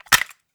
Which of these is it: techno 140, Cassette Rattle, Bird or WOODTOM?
Cassette Rattle